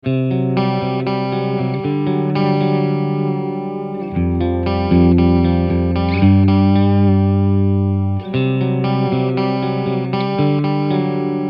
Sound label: Seasick Warbles; Strymon Compadre → Strymon Iridium → Strymon Deco; Tape